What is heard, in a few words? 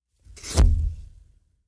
Electronic sucking sound